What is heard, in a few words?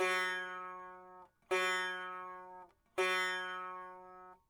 sitar